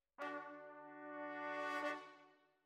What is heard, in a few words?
Trumpet